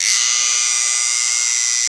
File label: hair trimmer trims hair